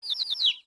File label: Cucco Chick; Cucco chick chirping